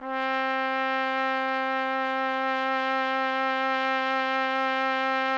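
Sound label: trumpet; Roland - LA Composer vol. 3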